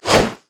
basic attacks; Vi

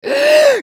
ultimate effort; Cassiopeia; Character Voices - English

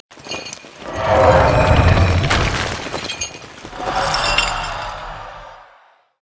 thresh recall; LoL

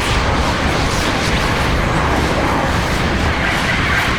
chaos storm; LoL; viktor

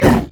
ancient golem effort; LoL; monsters

